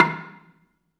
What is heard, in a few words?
bela2 D#2, belafon; USB Soundscan vol.60; AKAI